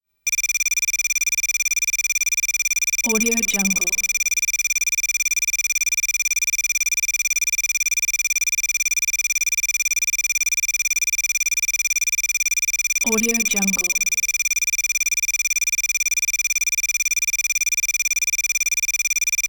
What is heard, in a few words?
graphics beeps rapid monotone beeps